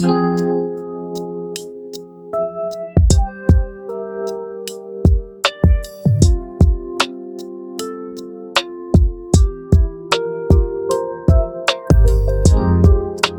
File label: rainy lofi